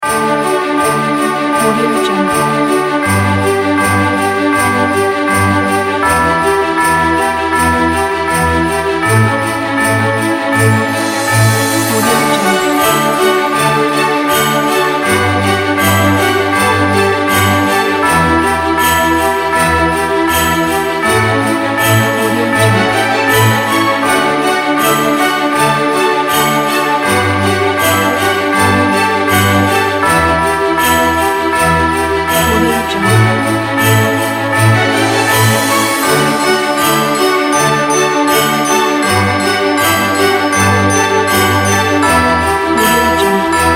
christmas time

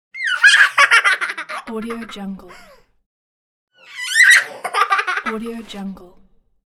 baby laugh

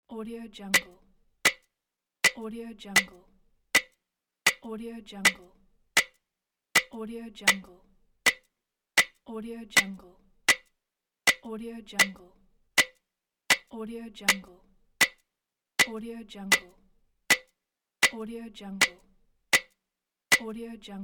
metronome

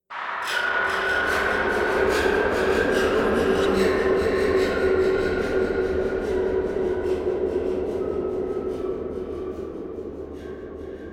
inauspicious ambients

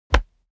impact body combat cloth bassy hit

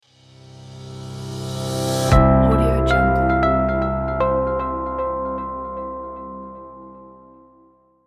corporate logo

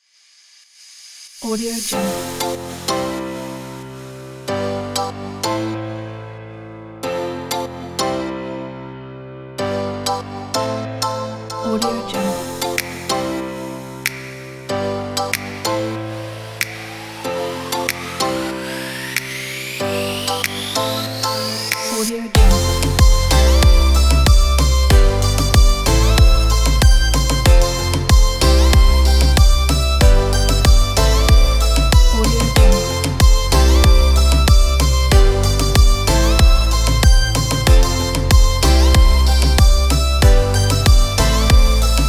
summer tropical

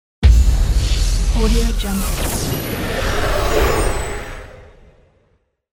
magic spell

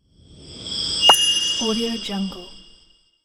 whoosh drop

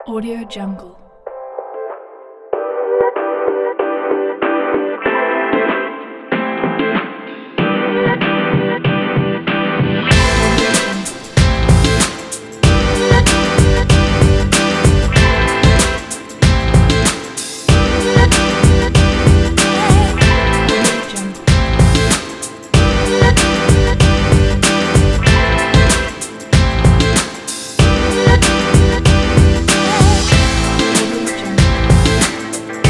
funk hiphop beat

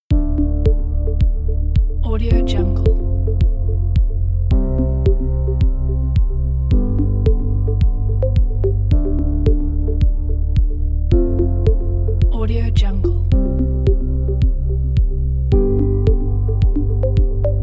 minimal tech

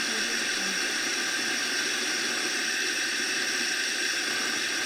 small water pipe flow loop

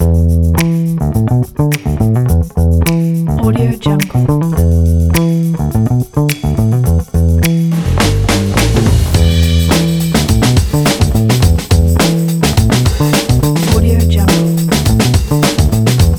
funky bass ident; vvalkietalkie

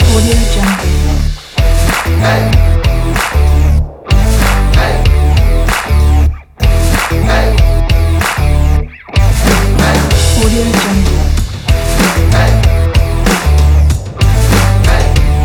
electro rock beat

